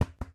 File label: Soccer Ball Hit; Lethal Company